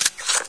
scout clip out; Source Engine; cstrike; weapons

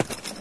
player step; Source Engine; dod

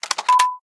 rocket pack boosters ready; tf

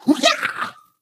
splits; Portal 2; Source Engine; video games; ballbot

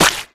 hitsound squasher

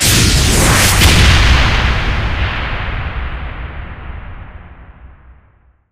powerup pickup supernova activate; Source Engine; tf

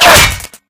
bullet impact bot

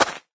step dance; taunt; player; yeti standee; engineer; source engine; tf

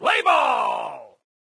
baseball open program; sound schemes

